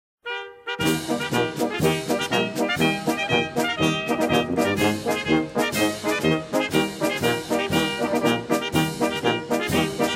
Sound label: Drum & Bugle